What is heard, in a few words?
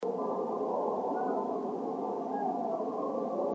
kitten